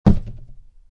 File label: box drop